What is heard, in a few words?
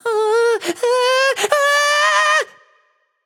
fear, voicepack; smite; jorm